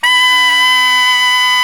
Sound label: SAX T. GROW, SAX Tenor; Tenor V-sw